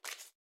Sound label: card change; hilo